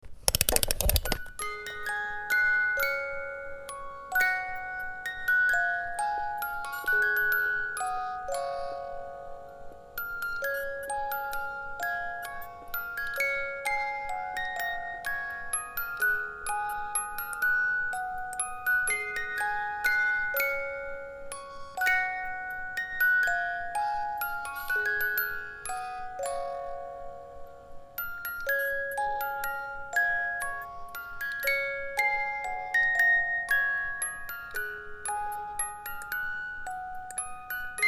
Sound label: Lullaby wound up clock guten abend gute nacht